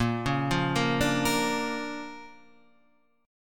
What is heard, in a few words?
D Minor Major 7th Sharp 5th A sharp, guitar